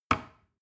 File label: plastic button small push heavy